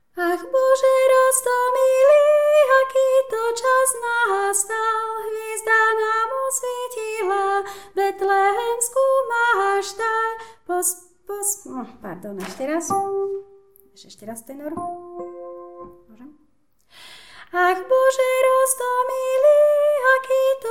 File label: tenor; Vianoce 2016 - Ach Bože roztomilý; Farnosť Letanovce